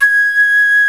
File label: SOLO WINDS